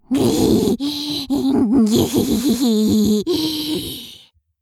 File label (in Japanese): 笑い＋痙攣; 失神中